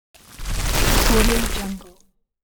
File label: plant grow